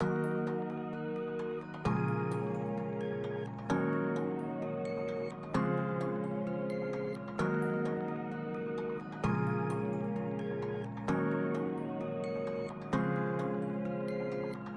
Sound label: Trance Trap Loop 130BPM D